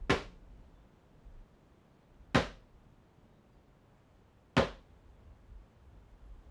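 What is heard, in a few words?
3 hits drum top of broch rec in courtyard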